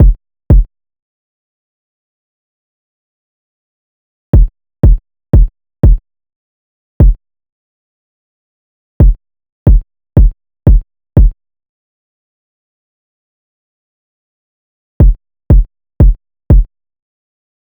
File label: Drum Loop - 90 BPM Kick; Loop Stems; Cymatics; Mystery Pack Vol 4; Pillow Talk